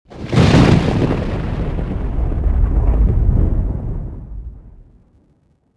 meteor sky; movers objects

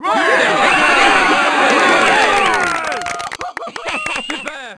goal, Crowd, French

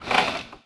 seesaw down; Tony Hawk's Project 8; XBOX; houses

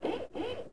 walk

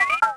vox, droids robots

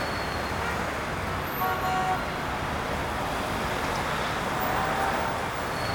City Ambient Loop; Totaled; XBOX

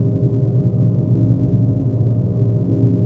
busy room, ambience; prototype

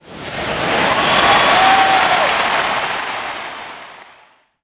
cheer, crowd; Splashdown; XBOX

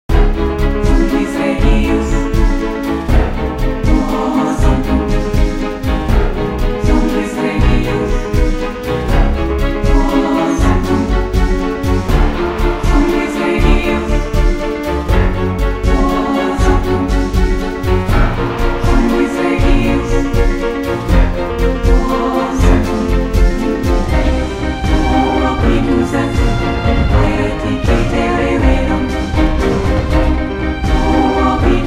Fast Amb; music; Louvre; The Da Vinci Code; XBOX

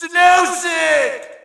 Shout, audience; UFC Tapout 2

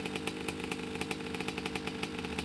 idle, Engine

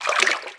fountain splash; Tony Hawk's Project 8; XBOX

